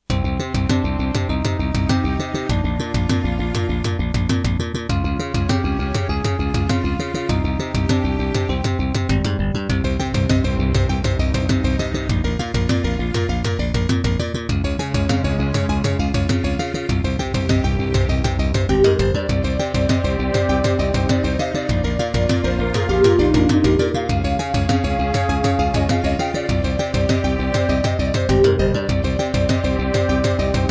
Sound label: Serdaco Dreamblaster X2GS NATIVE; Introduction; Hocus Pocus